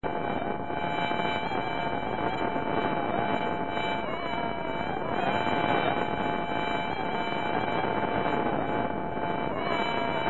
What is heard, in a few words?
beacon